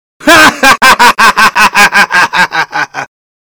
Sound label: laugh medic; fortnite emotes